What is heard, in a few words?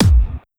Kick